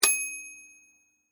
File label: Bell Typewriter; Technology; Typing; BlastWave